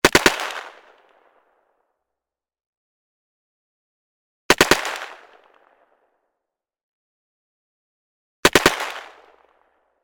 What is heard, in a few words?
gun carl gustav m45 swedish k; still north media